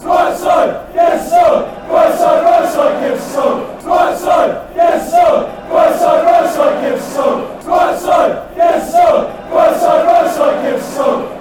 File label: give us song, fanchants; ipswich